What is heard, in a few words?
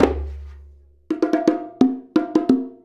Bongo Fill; Drums; Bongos; Essential Percussion